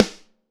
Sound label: DRY SN, STUDIO DRY SNARE